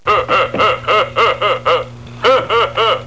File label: Robot Laugh; robot b9